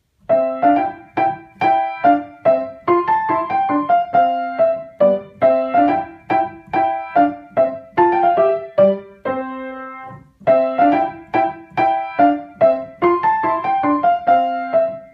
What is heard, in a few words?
courtly dance menuet; wagenseil georg christoph